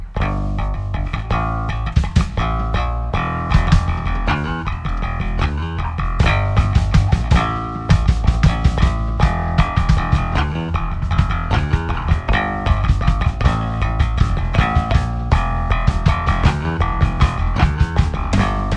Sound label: Bass Station